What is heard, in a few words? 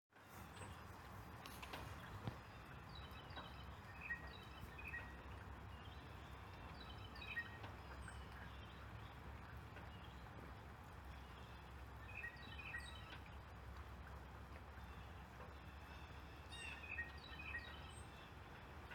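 blue jay tweedle jeep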